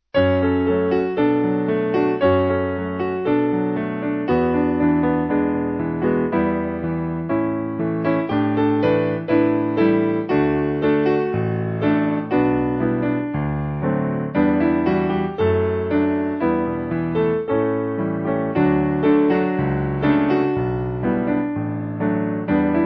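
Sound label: Piano, hymn backing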